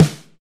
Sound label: warm snare drum d sharp key, Royality free steel snare drum sample tuned to the D# note